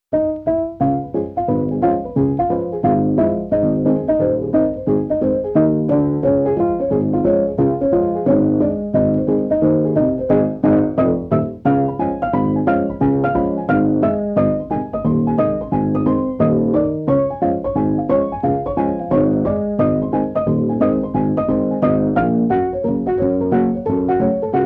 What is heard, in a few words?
charlie chaplin music